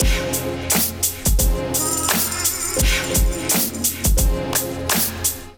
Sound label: Wah Soul Kit Melodic 86 bpm, Drums; MIDI Clips; Ableton; Chop and Swing